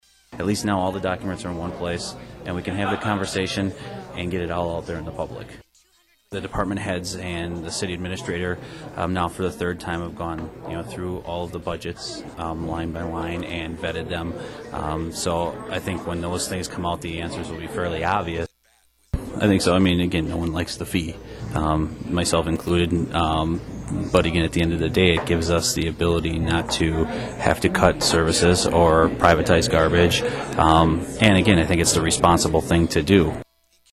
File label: Hammond